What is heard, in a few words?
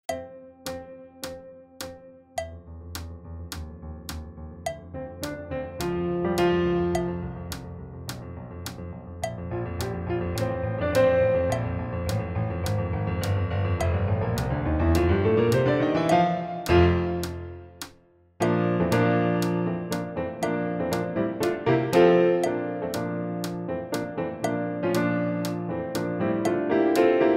piano fast; Uebungs-CDs; Playbacks